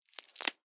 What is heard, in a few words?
Bonecrack; little robot sound factory